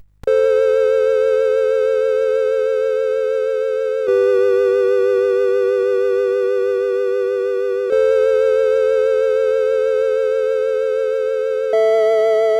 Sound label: sid CSG8580R5